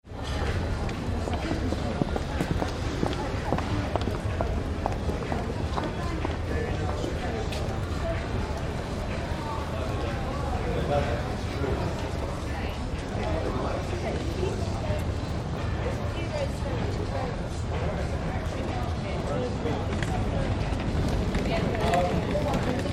City Atmosphere Street Level 1, Footsteps - voices; background traffic